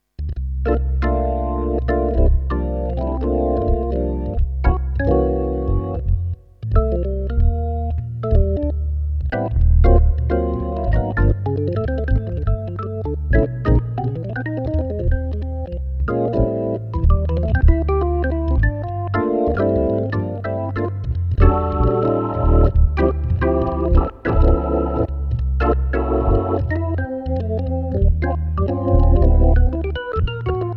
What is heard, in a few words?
Demo XK1 happy; hammond